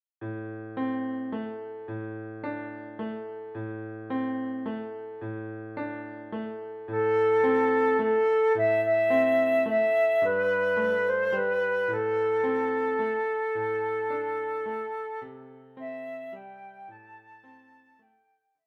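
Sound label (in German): Querflöte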